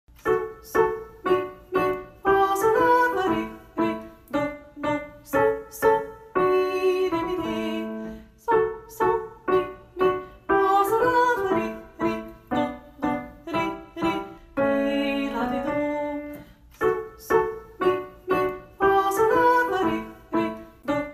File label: mozart klarinetkvintett; Mozart: Részlet az A-dúr klarinétkvintettből (IV. tétel téma, KV. 581.)